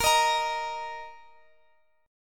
D sharp 5th

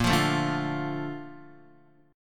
Bb Major 7th, Bb, down, Guitar; BbM7 strummed; JGuitar

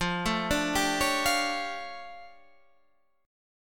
D Minor Major 11th, F

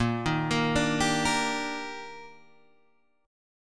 Eb Major 7th Bb

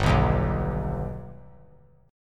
G Augmented G, chord; G+ strummed; JGuitar

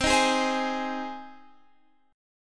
C Minor Sharp 5th C

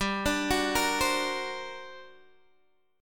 G Minor Major 11th, G, Guitar, DADGAD

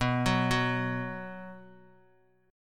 B 5th B Guitar, chord; arpeggiated; JGuitar

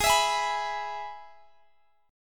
Ab Minor Sharp 5th Ab down Guitar Open G

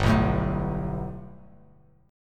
G Major Add 9th, Bass Standard; Gadd9 strummed; JGuitar

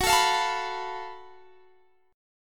Gb Minor Major 7th Double Flat 5th, chord

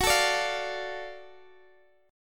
Gb Minor Major 7th Sharp 5th, Guitar, Open D; x,x,16,15,17,15; down; chord shape

